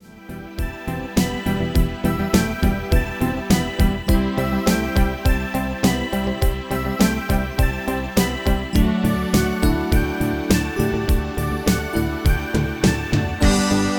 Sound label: MIDI